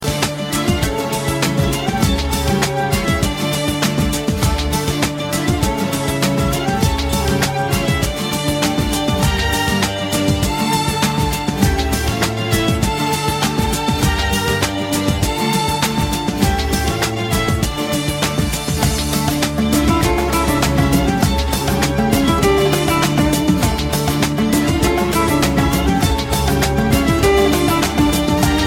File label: guitarra latino; ringtone